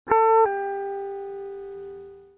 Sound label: pull off, egitarre